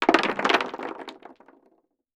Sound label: Impact Objects Concrete Debris Hitting Wood; Impacts